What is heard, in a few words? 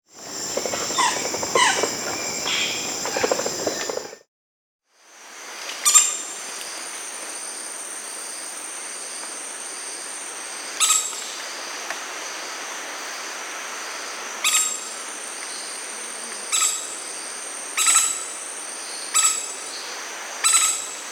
Crimson-crested Woodpecker (Campephilus melanoleucos), Peru, Bolivia; S. America